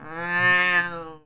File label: horror cat